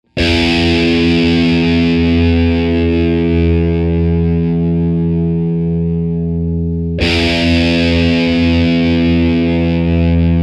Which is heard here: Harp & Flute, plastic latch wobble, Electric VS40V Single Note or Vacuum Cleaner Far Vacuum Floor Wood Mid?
Electric VS40V Single Note